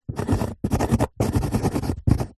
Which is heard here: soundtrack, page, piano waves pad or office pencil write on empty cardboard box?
office pencil write on empty cardboard box